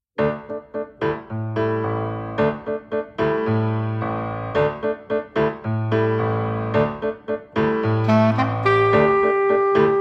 tango duo version

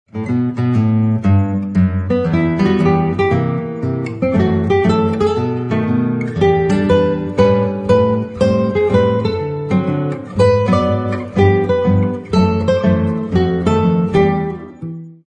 swing house

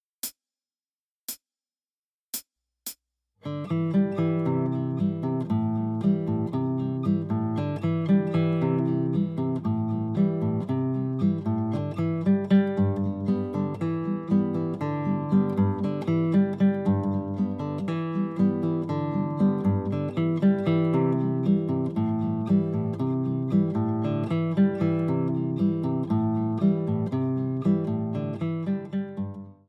cool groove

